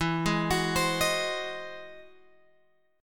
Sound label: E Augmented Major 9th E down Guitar Open F; arpeggiated; E+M9; JGuitar